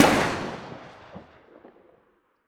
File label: tec9 distant, weapons; Counter Strike Model Pack; CS:GO